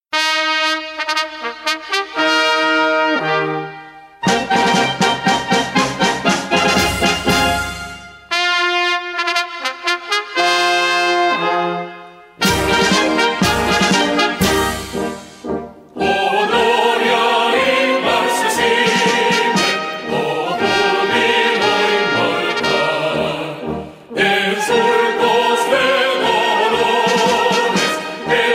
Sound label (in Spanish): himno de Colombia editado para izada de bandera